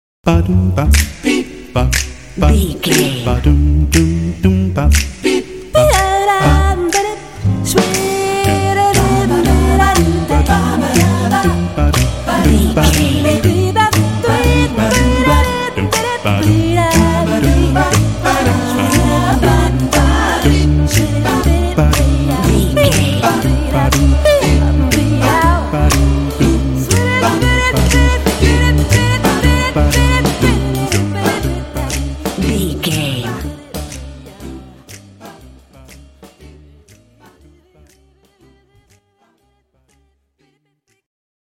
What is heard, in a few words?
jazz beep boppin boo dop